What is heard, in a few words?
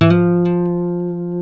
F 3 nylon, Guitars